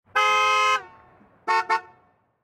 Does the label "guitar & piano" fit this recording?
No